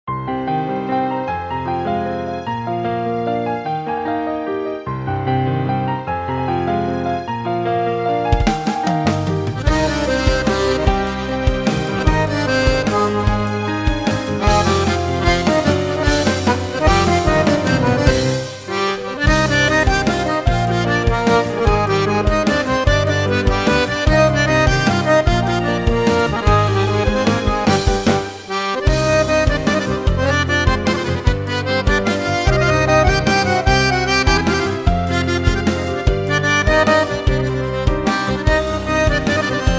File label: Accordion